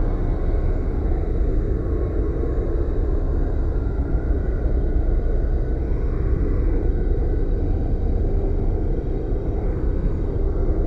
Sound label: ship enclave idle; Stellaris; overlord; Starbases